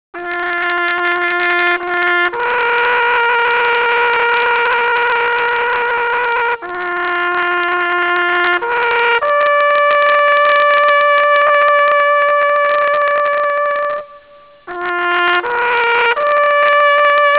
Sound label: taps